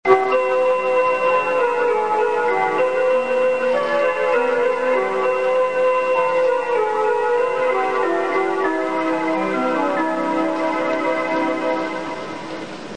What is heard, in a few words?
bus chime; 会津バス; 高速バスの起終点や途中休憩ではチャイム１〜３が流れ